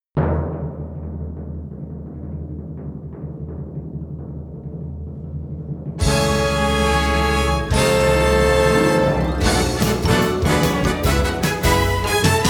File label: Fanfare; Network Production Music; NM110 You're The Star! (Multimedia)